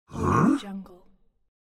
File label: orc confused